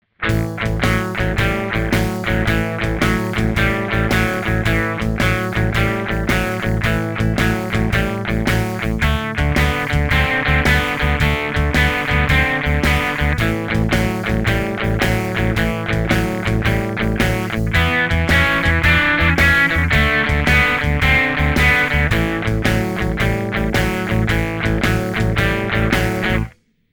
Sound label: shuffle blues